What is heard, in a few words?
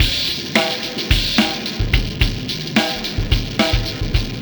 System Overload Beat, Processed Drumkits; Sonic Foundry (Sony Creative Software); Processed Drumkits Zero Gravity Beats